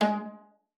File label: G#3, VIOLA FINGER; Xsample VOL-5